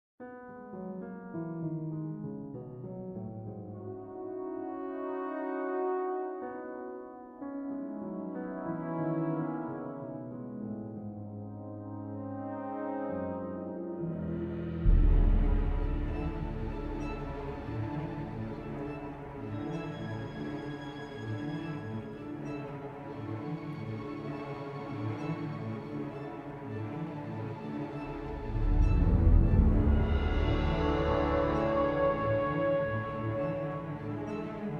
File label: World Music